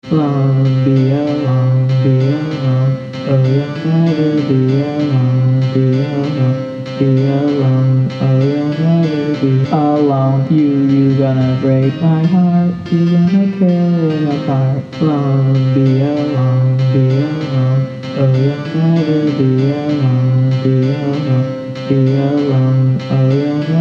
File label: piano and vocals